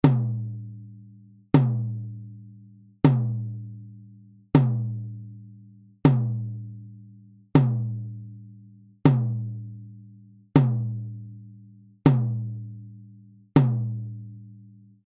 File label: Tom; SameSampleX10